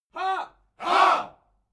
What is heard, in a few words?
military shout ha